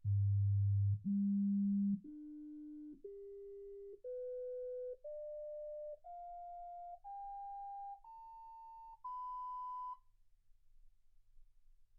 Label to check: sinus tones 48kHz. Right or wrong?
Right